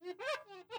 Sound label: crossbow lens